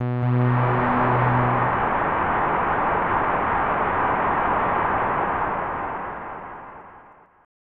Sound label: Goal Siren; SNES; hit the ice